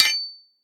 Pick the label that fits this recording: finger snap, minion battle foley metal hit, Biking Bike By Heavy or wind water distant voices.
minion battle foley metal hit